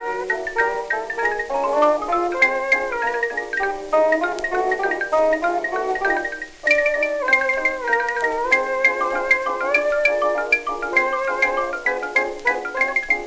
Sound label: Jazz, jukebox